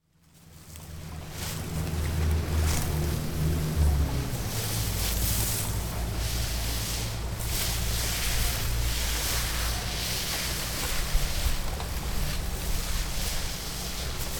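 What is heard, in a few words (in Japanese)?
hatakeshigoto no oto, sound of field work; Chino Cultural Complex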